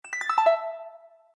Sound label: Bright Sound Video Game